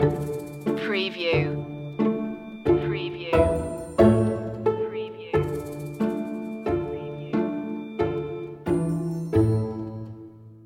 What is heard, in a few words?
lightly macabre loop